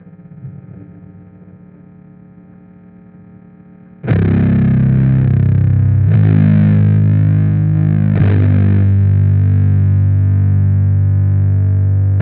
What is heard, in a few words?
bass; spinebashed; Bridge; 118bpm